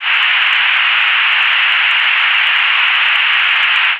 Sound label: Noise Fricative X